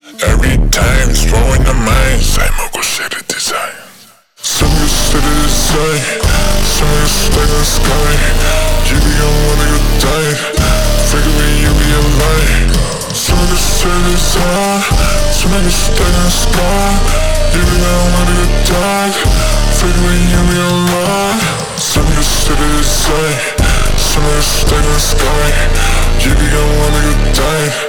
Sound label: pre drop pitched down transition